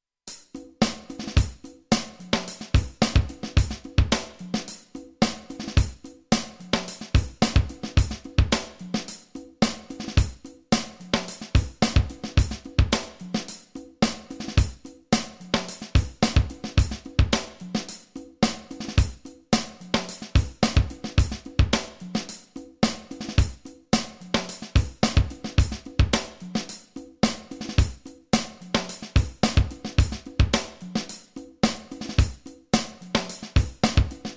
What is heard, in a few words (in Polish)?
funk perkusja szybciej